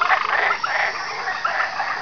SWAMP1, animal; 昆虫

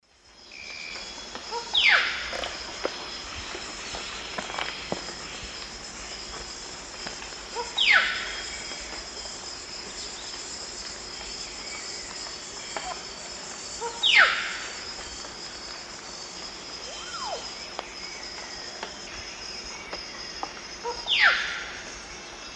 Clypicterus oseryi, call; S. America; Eastern Lowlands

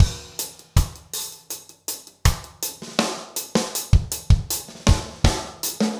reggae 80

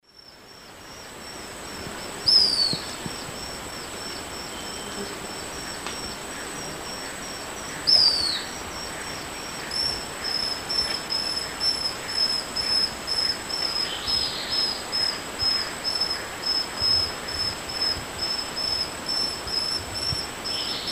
Neopipo cinnamomea, calls and song pair; S. America; Eastern Lowlands

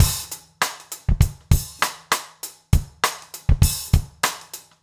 basic funk 100 rims

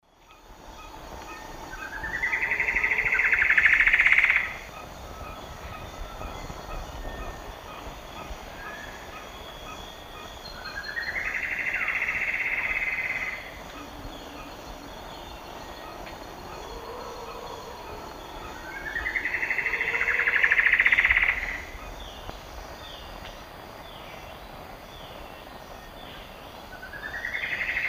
Myrmeciza hyperythra, song and duet; S. America; Eastern Lowlands

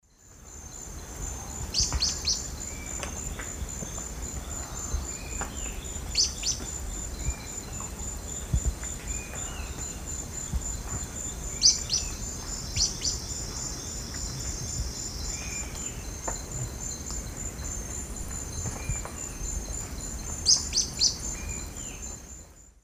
Epinecrophylla fjeldsaai, calls; S. America; Eastern Lowlands

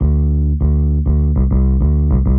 X Bass, Rock Bass

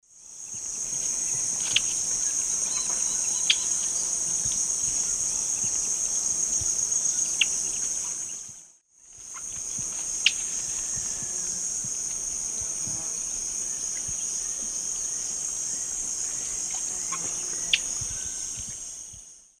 Laterallus melanophaius, calls; S. America; Eastern Lowlands

